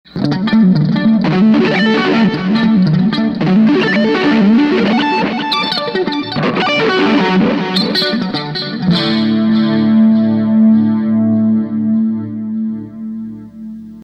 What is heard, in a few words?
clean lead